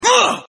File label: hit; leo